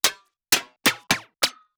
METAL SNARE MINI FLAM

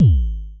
FM Kicks G#1, Vermona Kick Lancet; LookIMadeAThing Kicks

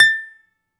BALALAIKA, Prima Balalaika, Plucked Strings

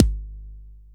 Kick, Akai MPC-500; Toolkits; Kick TKit

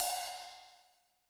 Drums, Kawai K4, Drum Machines

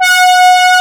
BRASSY; East West; Global Sounds

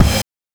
BD + CRASH; NES DPCM; MIDINES

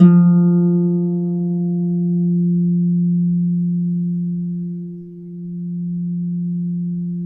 F2 HARP; USB Soundscan vol.62; AKAI

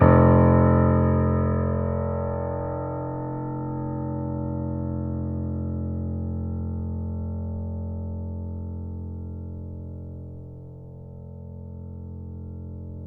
Mp F0, Grand Piano; AKAI S6000 CD-ROM - Volume 2; Grand Piano 256M

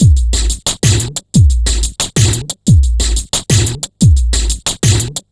slightup snare 090bpm; Zero-G; Downbeat Dance Grooves

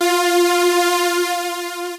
Lead - F

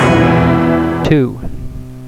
MORE NOISE, HITS; Kid Nepro - Elite

